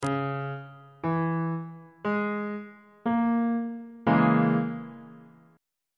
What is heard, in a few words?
c sharp minor 6th chord on bass clef